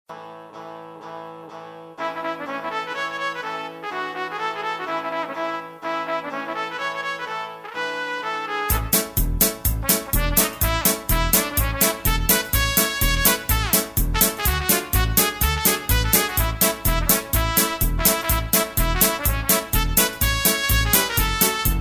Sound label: harmony